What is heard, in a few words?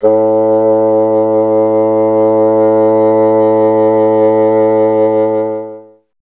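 wind bassoon A; Miroslav Vitous; Solo Instruments VOL-1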